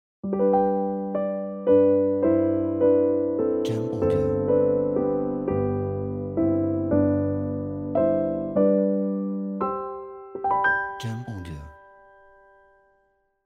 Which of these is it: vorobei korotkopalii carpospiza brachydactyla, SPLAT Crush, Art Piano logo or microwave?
Art Piano logo